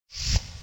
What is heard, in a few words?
Spin Stop Win; Crazy Rockets